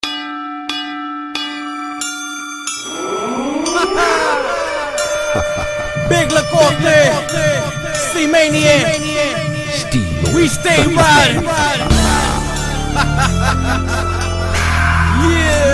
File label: Gangsta Beat